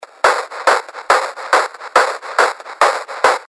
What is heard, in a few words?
140 BPM, techno loops; Burn; Revealed Techno Top Loops Vol. 9